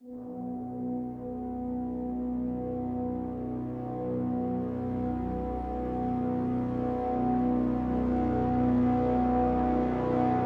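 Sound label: Crescendo Brass Swell Full (B)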